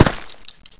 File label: player dirt; xash3d fwgs